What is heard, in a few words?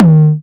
Perc